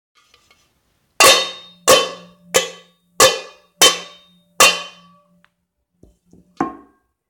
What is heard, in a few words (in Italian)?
spaccatimpani